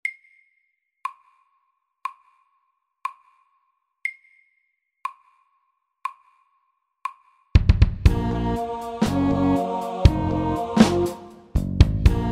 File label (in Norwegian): Octave rap SAKTE